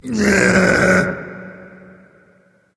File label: nv tongue; Smite; awilix